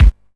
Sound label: YM Kick, DRUMS